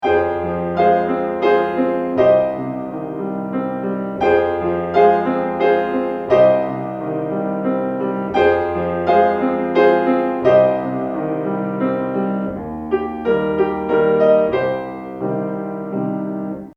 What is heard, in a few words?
Ringtone